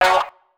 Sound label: harsh, Guitar; Notes